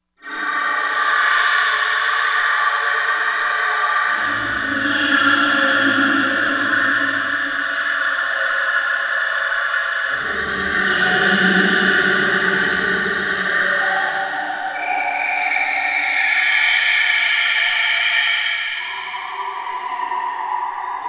Dark City, Korg MSC-16S; Environment

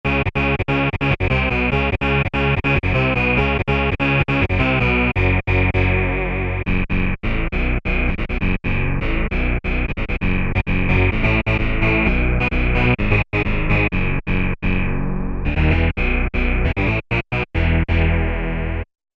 Formant Bass, Peavey DPM Spectrum Bass

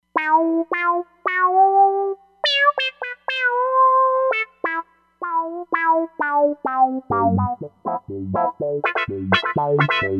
Vintage Duck, Roland Fantom XR

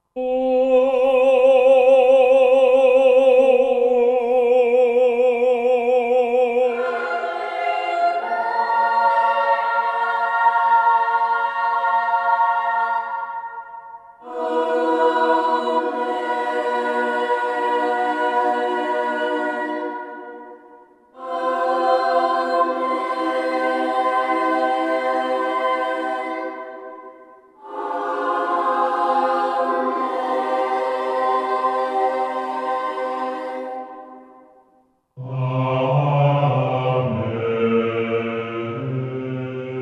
Vocal Menu; Roland SR-JV80-13 Vocal Collection

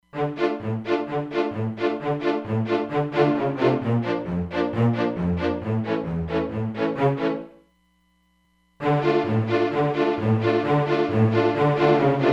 Pan Slaps, Roland DEP-5; Factory Patches

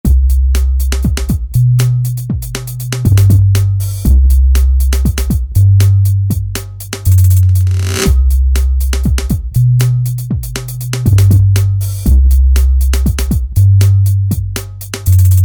Jungle

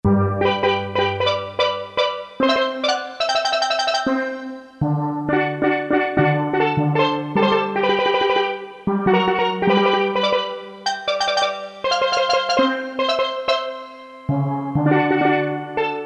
STEEL DRM, Korg DSS-1; Mixed Percussion

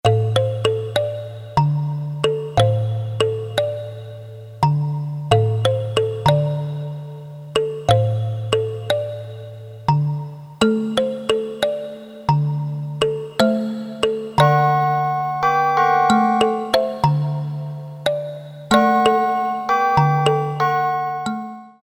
KAL CHIME, Mixed Percussion, Korg DSS-1

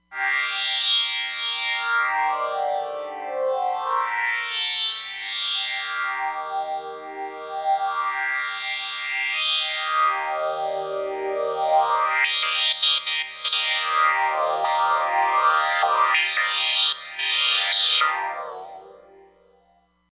Gritty Pad, Roland Fantom XR

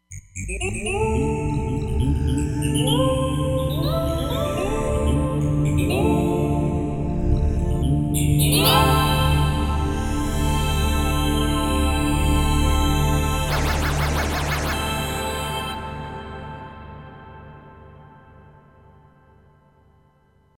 Inner Voices, Roland Fantom XR

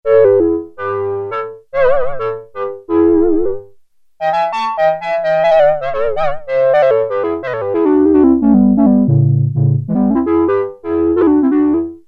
Digital Solo; Sequential Circuits Prophet-5 (Ver.3); Factory Patch; File 2 Programs

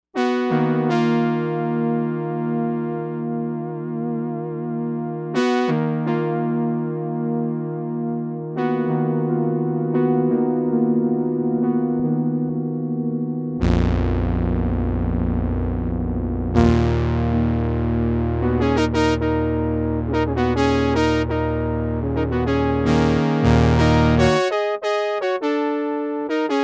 Matrix Brass

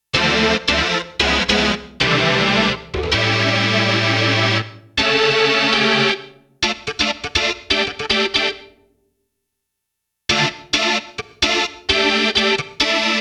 ROCK ORGAN, Roland JX-1; Factory presets

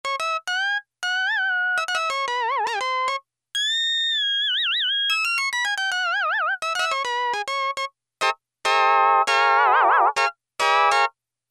SYN-LEAD, Yamaha DX7

